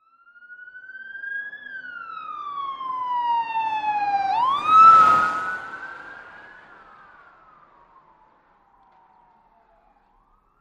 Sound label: Police Siren: Fast Car By, with Various Types And Engine Noise.